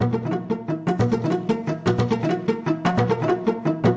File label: random string plucking; STRINGS ORCHESTRAL CLASSICAL LOOPS